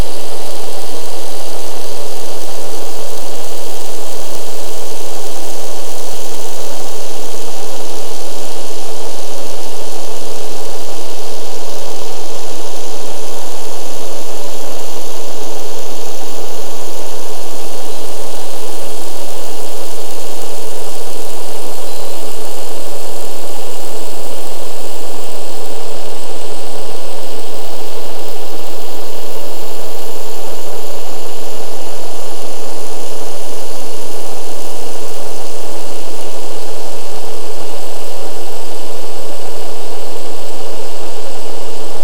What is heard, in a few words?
Boat noise underwater